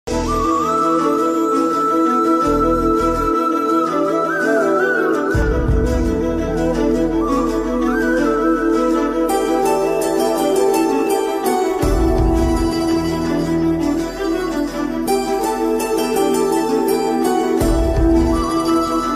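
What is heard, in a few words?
love song background music; ek mini katha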